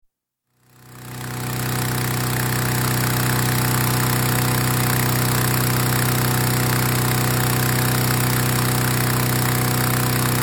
Hot Air Ballooning, fan filling balloon with air on ground, burners join in, fan stops; Miscellaneous Sport Sound Effects; BBC